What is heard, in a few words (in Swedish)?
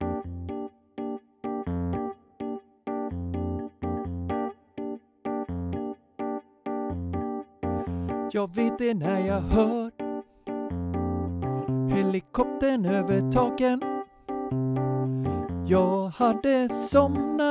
Tenor